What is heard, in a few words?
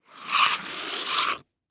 Foley Footstep High Heels Scrape On Cement Long; Foley Footsteps